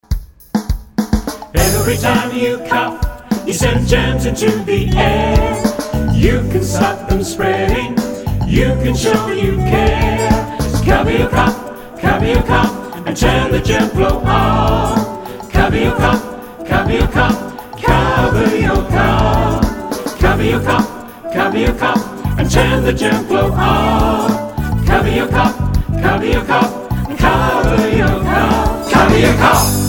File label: Cover Your Cough vocal